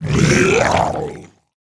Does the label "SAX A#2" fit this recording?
No